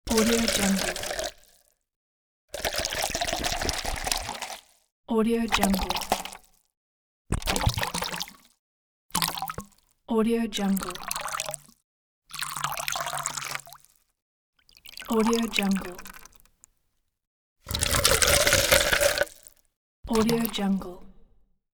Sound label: short drink pour pack